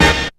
HIT, STAB; SoundFactory; SMPMANIA

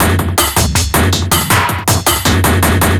Old School Break, Drums

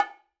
Acoustic Percussion One Shot

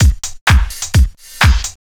Dance Drumloop; Drums; Dance Beats

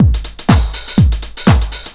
LOOP; SoundFactory; DRUMLOOP

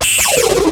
circuit

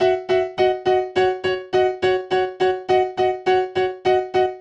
Acoustic Piano

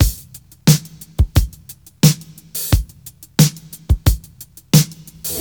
Urban Beat, Drums

